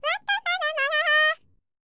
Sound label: C ASIA, World Voices